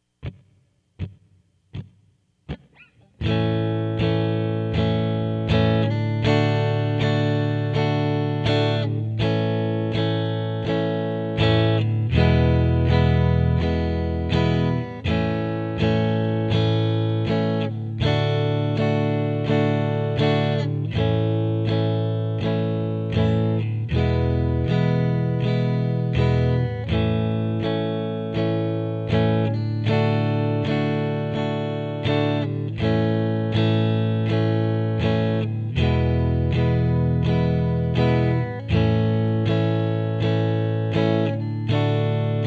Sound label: low e, guitar